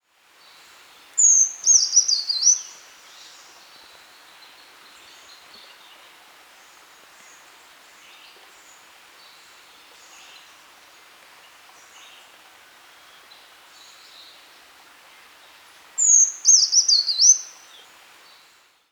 Brown Creeper Certhia americana alticola; BC

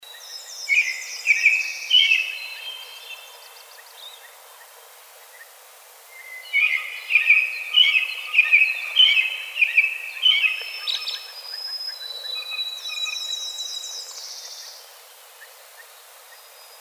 American Robin Turdus migratorius; BC

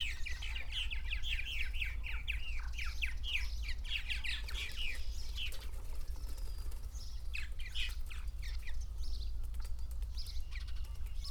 Purple Martin Progne subis; BC

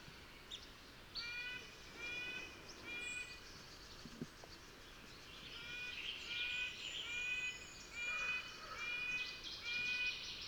Red breasted Nuthatch Sitta canadensis; BC

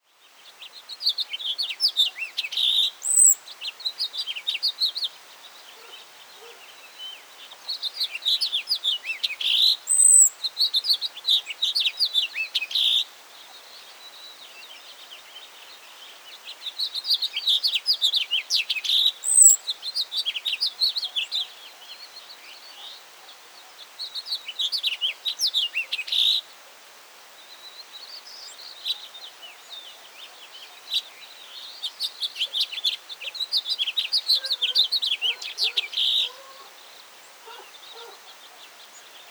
House Finch Haemorhous mexicanus; BC

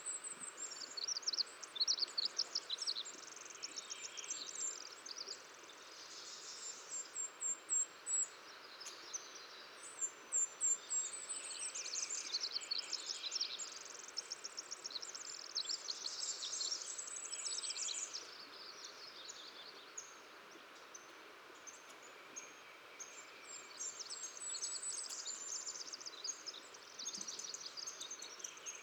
Pacific Wren Troglodytes pacificus; BC

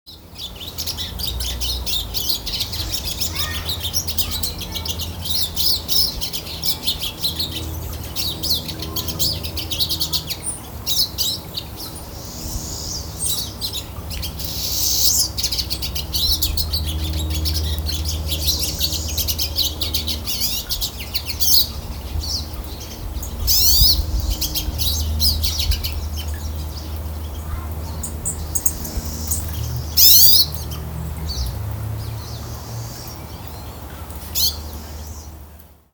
Pine Siskin Spinus pinus; BC

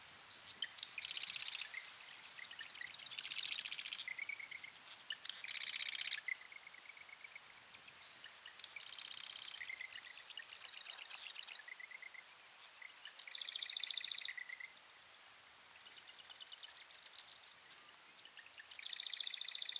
Marsh Wren Cistothorus palustris; BC

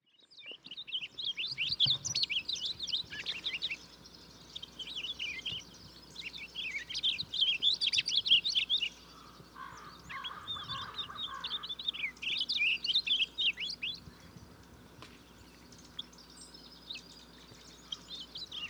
Purple Finch Haemorhous purpureus; BC

